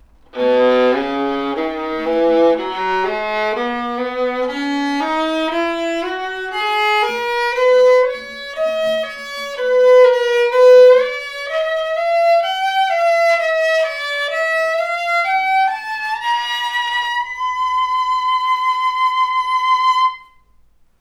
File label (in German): Bratsche; La Ville di Gremone